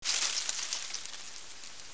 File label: BUSH